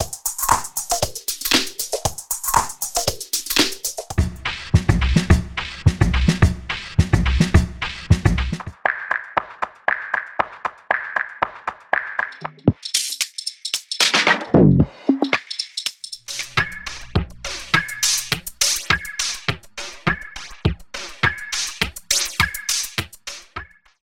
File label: Filtered Tops